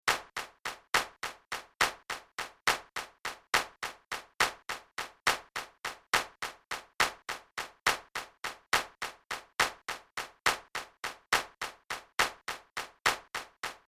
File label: Flamenco Fandango